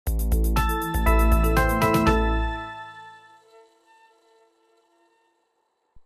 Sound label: percu; Logos